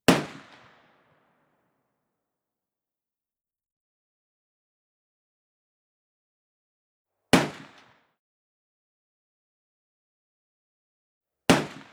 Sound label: Gun Musket Single Shot x7; Electa Quinney